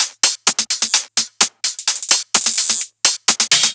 Loops 128BPM, Drumloops

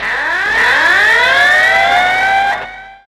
silly sci-fi; AMG; Now CD-ROM (Roland); NOW! FX

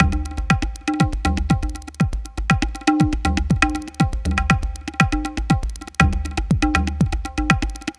Tablatron, Processed Percussion

